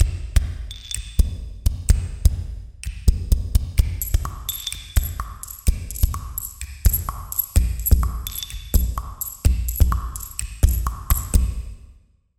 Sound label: Jingle; Milky Way